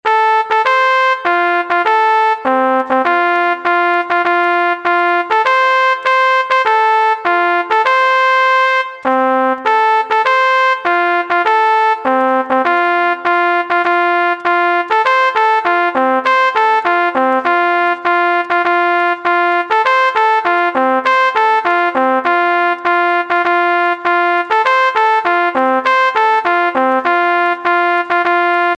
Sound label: Fanfare